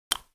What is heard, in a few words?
12cal shell soil, shotgun; bullets